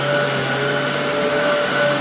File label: moving; InteligentnySaper